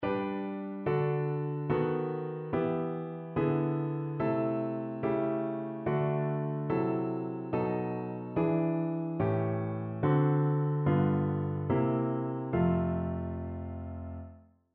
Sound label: Secondary Dominant Example